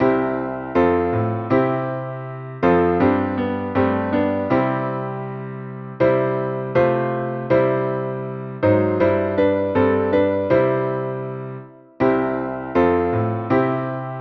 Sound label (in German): Klavier